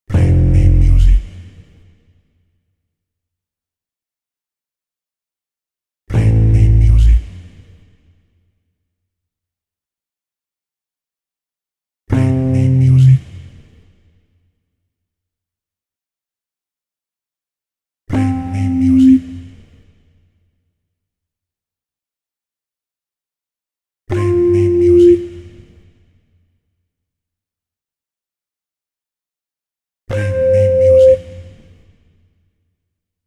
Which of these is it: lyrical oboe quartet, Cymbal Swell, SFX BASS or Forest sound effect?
SFX BASS